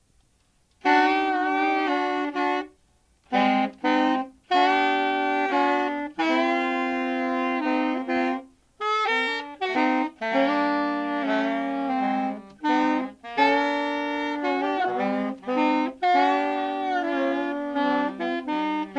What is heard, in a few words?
horns